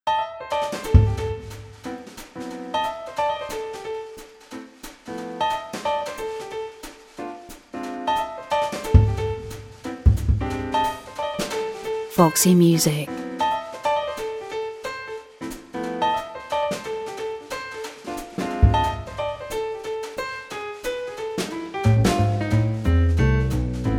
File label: Jazz Trio; Foximusic